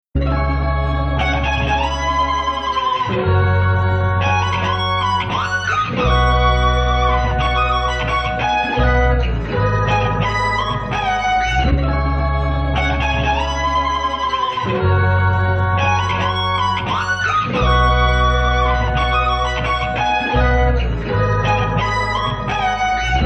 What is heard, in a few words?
Instrumental Interlude